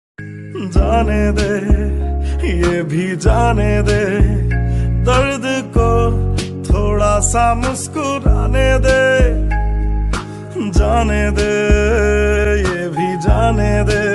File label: Desi Punjabi Ringtone